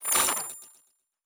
Device Start, Electric

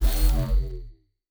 Shield Device Stop, Electric; Sci-Fi Sounds

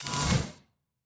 Door, Sci-Fi Sounds

